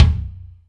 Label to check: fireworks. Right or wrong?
Wrong